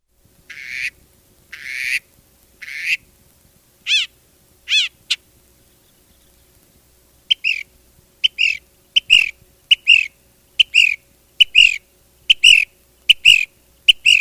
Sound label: mocking bird